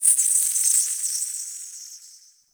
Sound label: Insect Buzz; Ambience; One Offs; Warframe; Eidolon Plains